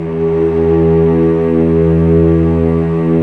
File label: STR, Vcs mf Amb